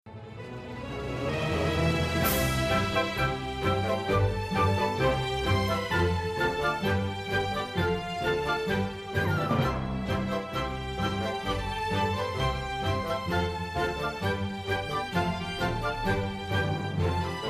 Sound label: background music; little einsteins